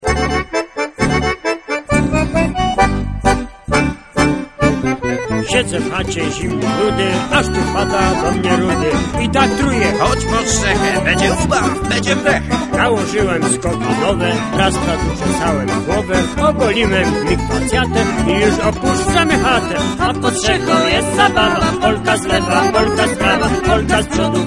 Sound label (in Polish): polka w deche